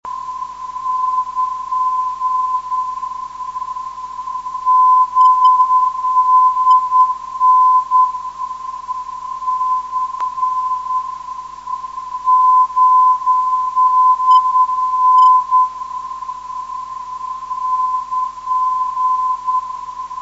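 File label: ng 322kHz, non-directional beacon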